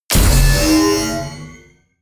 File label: sci-fi click positive heavy; hits impacts